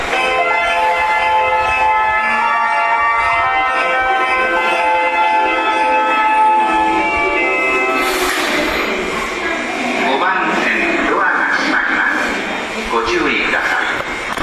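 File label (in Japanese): 発車メロディ, 大崎駅, 湘南新宿ライン・りんかい線; 新木場・横浜方面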